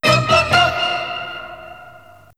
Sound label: tadada